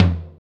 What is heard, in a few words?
gretsch tom, deep house single hits; Zero-G Deep House